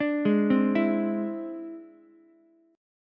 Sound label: E Augmented 7th E down Ukulele; E+7 arpeggiated; JGuitar